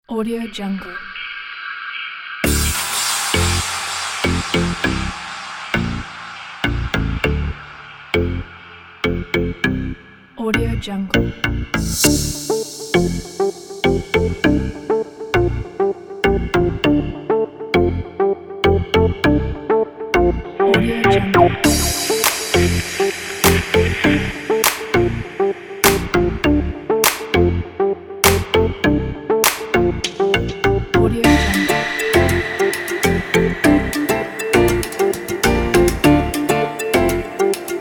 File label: soft technology